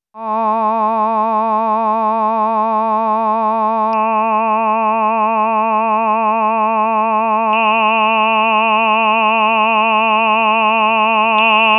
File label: Singers formant